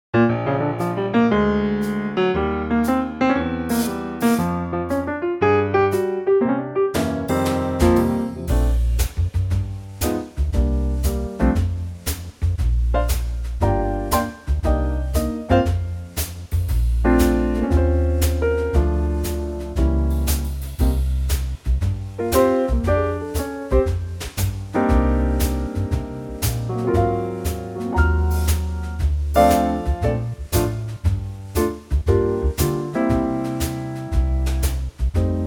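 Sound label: Bb, backing track; Jazzville; key - Bb - range - Bb to Eb; Classic Trio arrangement, at a great tempo, of this classic Broadway gem.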